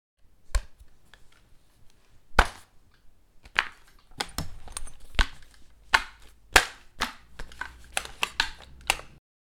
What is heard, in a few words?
Sniper rifle handling foley movement